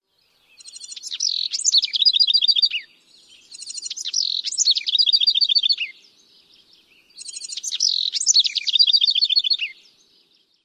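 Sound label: song, house wren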